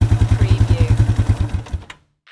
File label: Quad Bike Shut Off Engine Perspective